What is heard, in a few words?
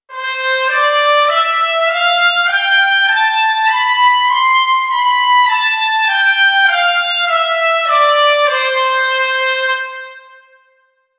Ob Cl Brass